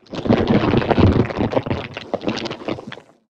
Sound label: MANTIS attack